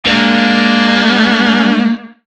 Shawzin Min Chord L; Music; DE; Warframe; Lizzie Shawzin

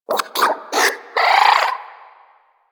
Player Lure Call, Animal Lure; Deimos; Nexifera

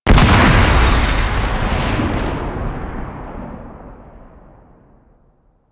Ship Power Tether Explosion; Powers; Warframe; Railjack

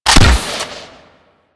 Hunting Bow Prime Fire C; Warframe; Weapons

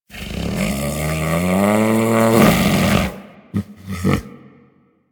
Predasite Vocal Snore; Warframe; Hunting Critters

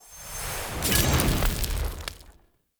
Fire Blade Cast; Weapons; Enhancements; Elemental

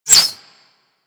Arrow Whizzby; Warframe; Weapons

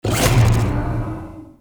Monster Spit; Warframe; Infested

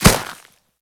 Kubrow Step Snow, Footsteps; Enemies; Warframe

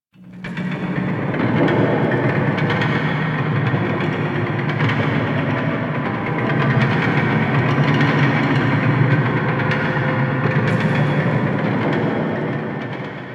Dungeon Island Chains, Ambience; Warframe; Duviri

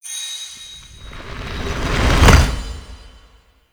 Gargoyle Placement; Entrati Labs

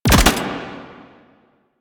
Archgun Mech; Warframe; Archwing; Weapons

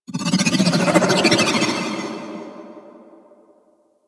Mountain Pass Sculpture Contract; Warframe; Ambience; The War Within

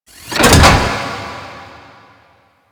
Hub Docking Latch; Liset; Ambience; Warframe

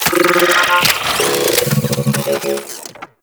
Reinforce Beacon Deploy; Warframe; Venus; Reinforcement Beacon

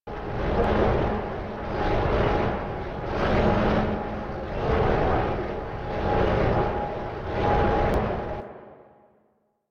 Windmill Loop; ambience; props; Eidolon; Warframe; Eidolon Plains

